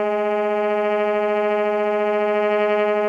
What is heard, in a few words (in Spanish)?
Tremolos